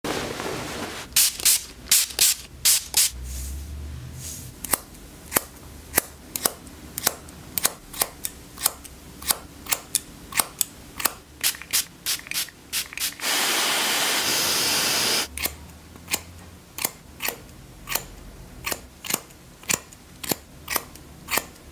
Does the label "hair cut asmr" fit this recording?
Yes